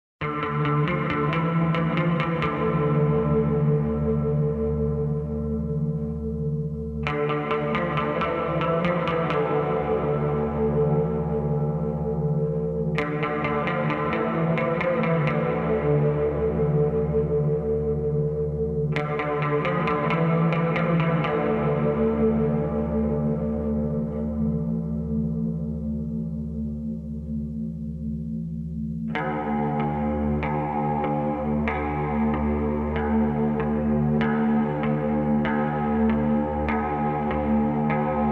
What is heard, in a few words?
Pad